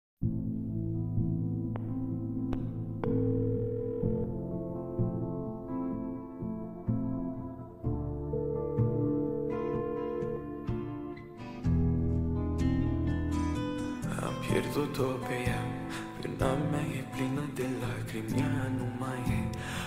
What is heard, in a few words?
reverb bass boosted